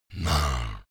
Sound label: beast growl low, comedy cartoon; kreaturen; soundpool